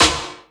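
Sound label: snare drum, Drum & Bass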